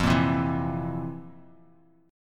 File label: F Major 7th Suspended 4th F, Bass Standard; FM7sus4 strummed; JGuitar